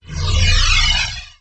spawn; quake2

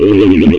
pain; quake2; sorb

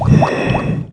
gurp; quake2; cobra

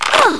fall; quake2; rei

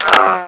fall; quake2; gunner

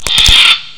fall; quake2; ostrich

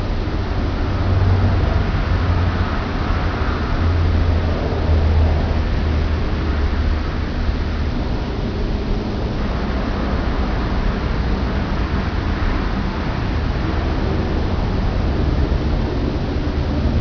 city ambient; quake2; chaos; mom

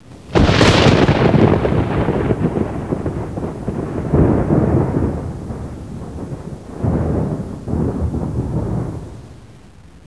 thunder; quake2; kitsune

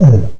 bump; quake2; ichabod

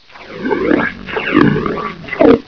death; quake2; ranger

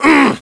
pain; quake2; ufops

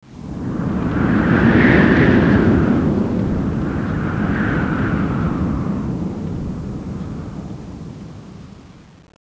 wind gust; quake2

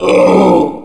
pain; quake2; morticus

